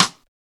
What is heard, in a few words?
R+B STICK, SIDESTIKS